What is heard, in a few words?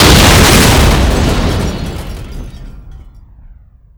metal, explosions